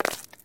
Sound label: concrete land; Golden Crayon Sounds